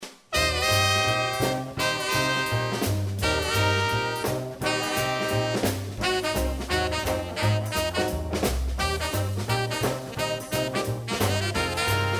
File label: BOOGIE